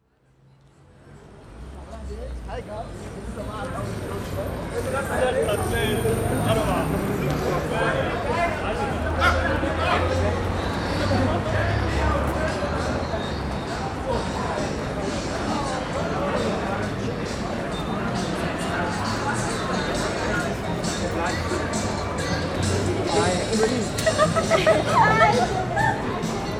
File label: Niederdorf